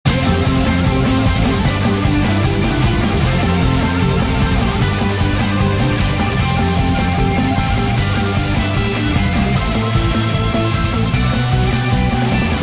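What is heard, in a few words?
background music Bonus Day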